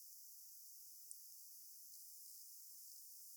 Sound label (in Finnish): laulurastaslinnun aani